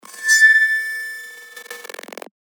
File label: PERC A, percussion; revealed percussion vol 1